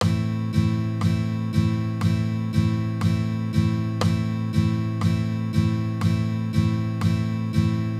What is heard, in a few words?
single chord strum and